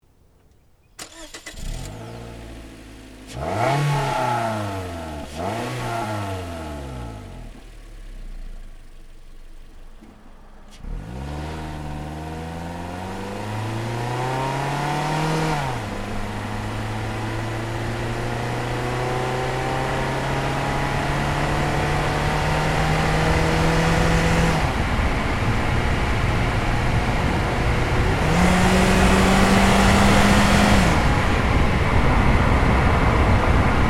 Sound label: Toyota Corolla S